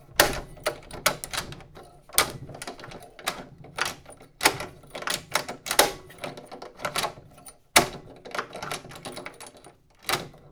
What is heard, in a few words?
locking room door